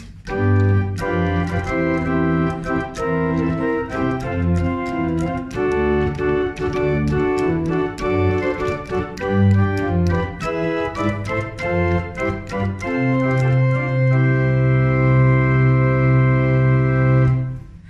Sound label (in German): Englisches Positiv 3 I, orgel